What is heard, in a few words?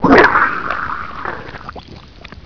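alien grunt die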